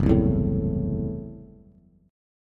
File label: Gb Minor 7th Flat 5th, Bass; strummed; JGuitar